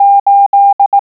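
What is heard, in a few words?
EIGHT, morse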